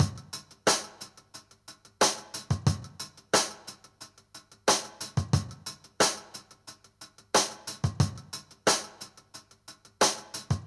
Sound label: beat